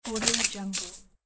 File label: big insect